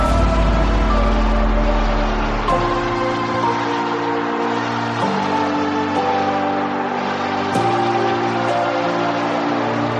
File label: finisher music; champions 2023